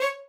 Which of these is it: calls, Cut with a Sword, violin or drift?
violin